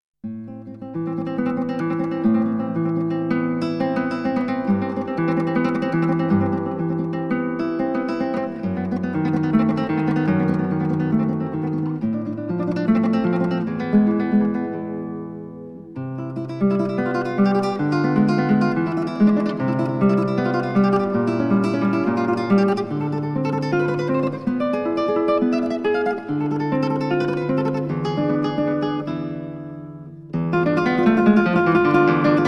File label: Etude tremolo